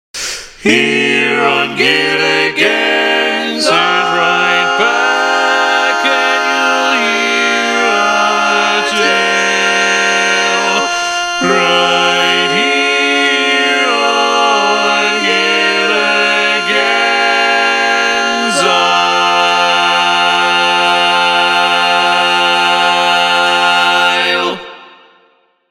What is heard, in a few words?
All Parts, barbershop tags